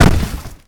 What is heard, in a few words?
Crash Body Impact Wall